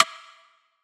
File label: Perc; Zay Signature